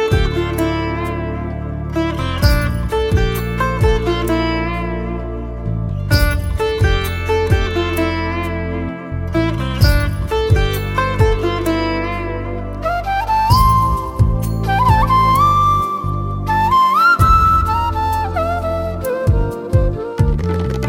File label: Instrumental Bgm